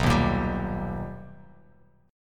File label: B Augmented Major 7th B Bass Standard, chord; strummed; JGuitar